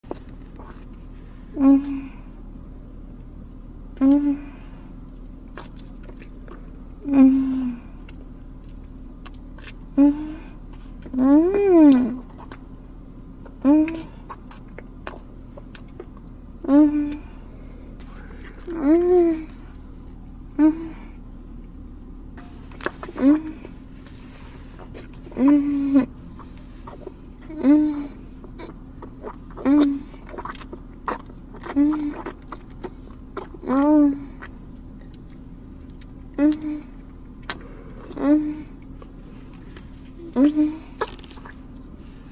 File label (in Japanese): 人妻, ガチのフェラチオボイス; チンポをしゃぶりつくす; ガチのフェラチオボイス4